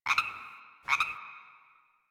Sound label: Drifter Camp Water Creature, Creatures, Ambience; One Offs; Warframe; Quests; The New War